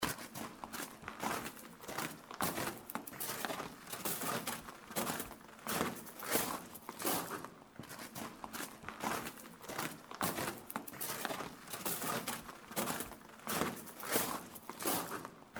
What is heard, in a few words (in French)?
Gravier